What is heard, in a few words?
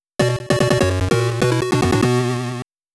GAME OVER (PSG); New City Hero